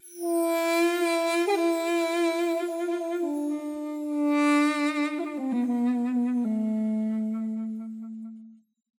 Duduk; Temple Rnd; tintin reporter cigars of the pharaoh

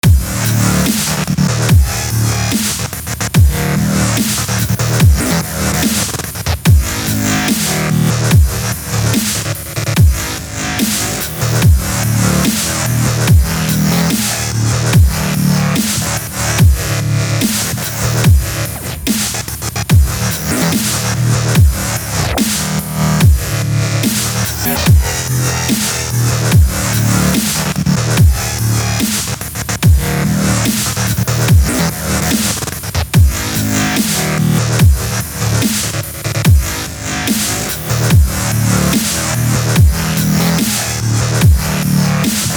music remix loop; Equalizer

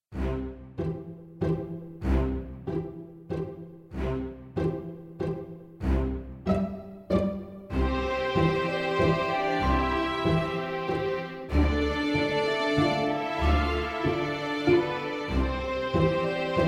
Intro Music; Shadow of the Game

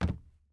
plastic land

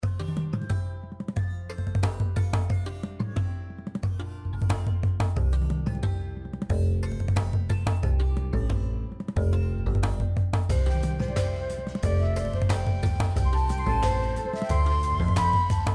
Music Menu; Doodle God Puzzle Collection

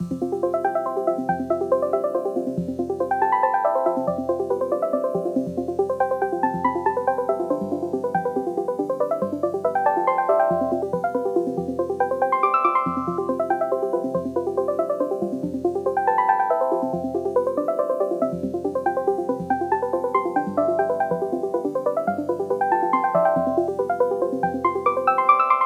Drones; Hohokum; PS Vita

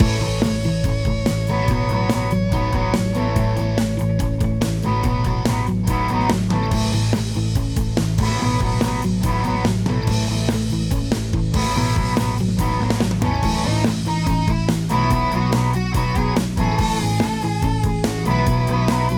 Music Event Loop; Crash Drive 2; Music Event Track2 Loop

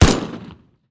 tools gun

